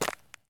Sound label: run concrete; Escape from Tarkov